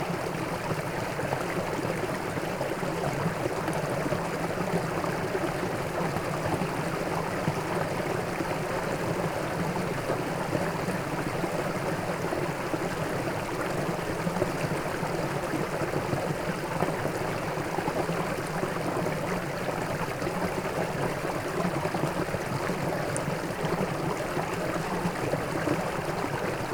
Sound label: Moderate Water Stream; WATR Flow